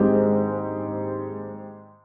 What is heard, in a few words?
E Keys, One Shots; Keys & Synths; Touch Loops; BlissedOutBeats